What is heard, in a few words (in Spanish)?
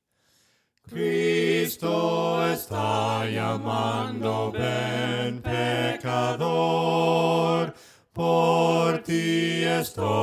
VOCES, himnos a capella; cantos del camino; VEN PECADOR